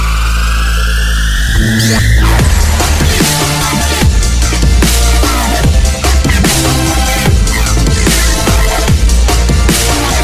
Dubstep Remix

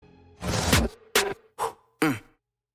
Mmh; Ekko

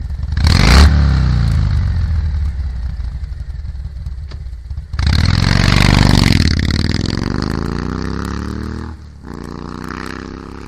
Motorcycle

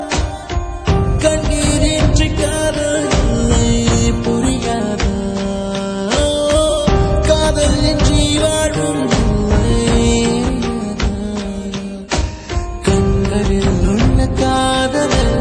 Sad Love Song

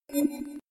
Hi-tech close

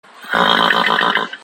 Horse whinny

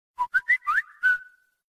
Noti whistle Samsung

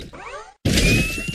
Repulsor Blast

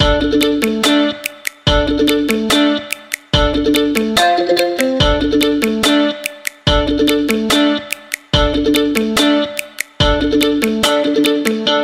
Guitar Song

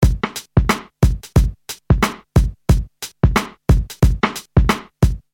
Drum Loop

